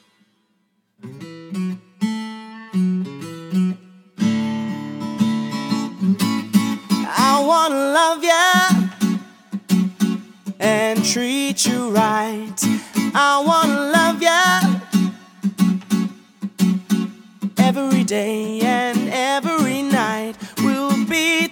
Acoustic Soul

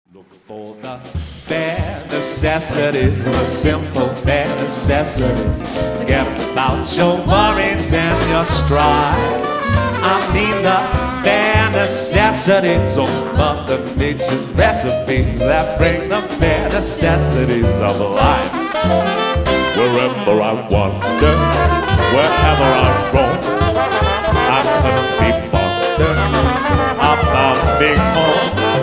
Swing Band, Live

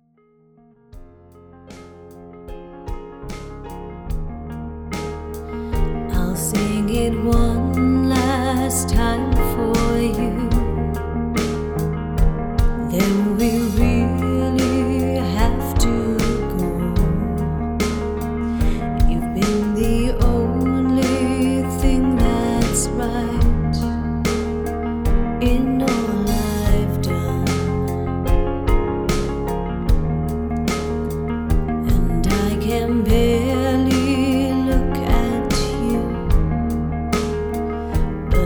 Function band

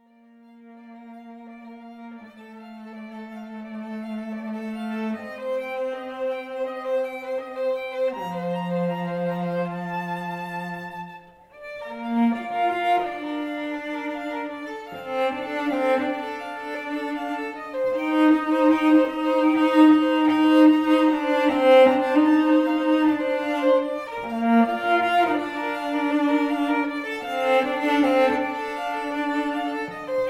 String Duo

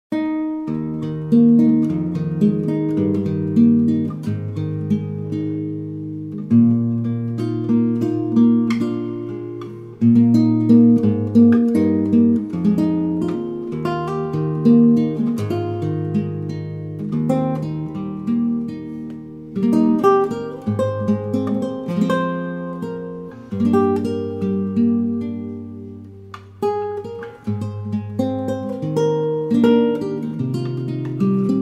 Solo Guitar; Wild Mountain Thyme

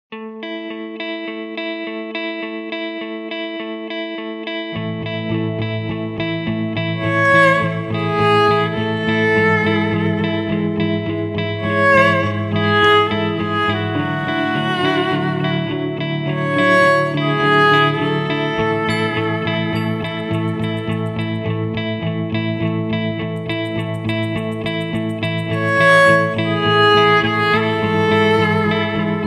Solo Violinist

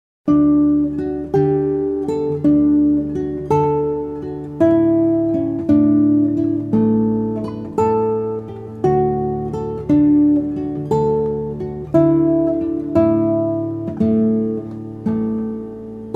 Solo Guitar Largo

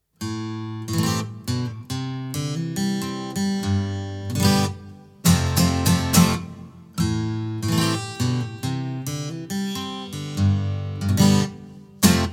Solo Singer Guitarist

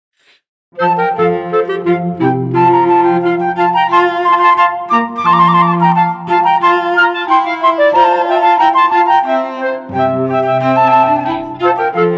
Classical Trio; Fiesta Classical Trio; Mouret

